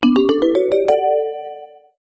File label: Jingle Happy Short